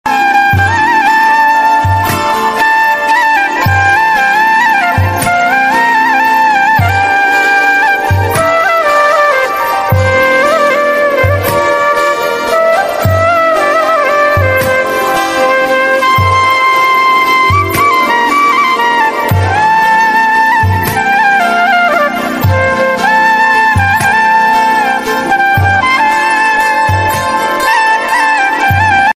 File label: old hindi music love ringtone flute